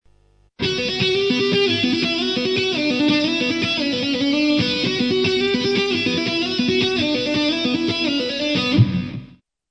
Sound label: the B string, Exercices de Guitare